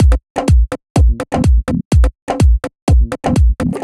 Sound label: C# 125bpm, House Drumloops; 90 s Sample CDs; Zero-G House Fabrik Drumloops; ZG Drumloops